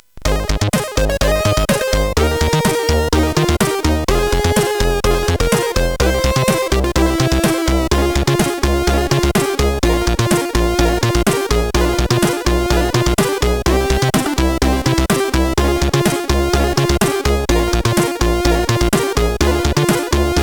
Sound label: Congratulation, sid CSG8580R5; hvsc